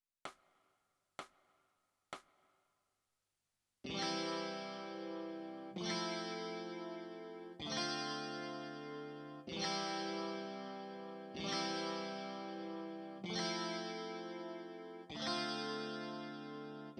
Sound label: E Guitar